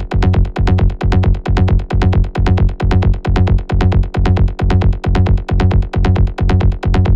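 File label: Bassline F# 134 BPM; Loops; Gore Bassiline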